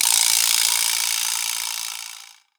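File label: Vibraslap; Roland SPD-S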